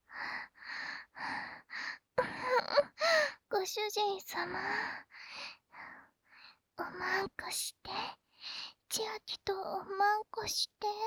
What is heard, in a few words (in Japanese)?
エッチしよ 喘ぎ; ハーレムオナサポ 4人のメイドがご主人様のオナニーをお手伝いするバイノーラル～文、千曜、名月、莉桜～